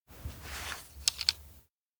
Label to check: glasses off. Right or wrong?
Right